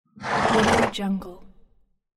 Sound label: horse snorting